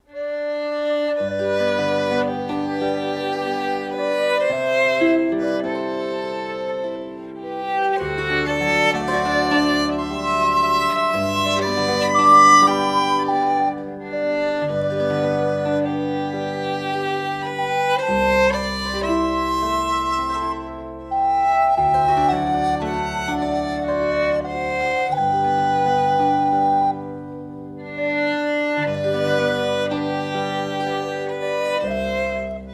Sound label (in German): Fuehrungsjodler, Zither; (live); Violine, Blockflöte, Zither